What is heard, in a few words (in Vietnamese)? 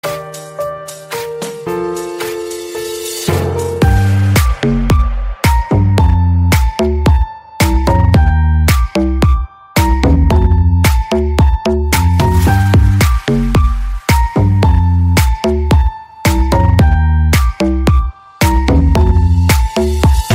không lời remix